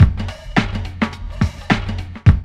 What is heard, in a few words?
LOOP, DRUM; E-Lab; X Static Goldmine 2; akai